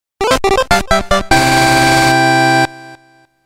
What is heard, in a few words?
Round Clear; Block Ball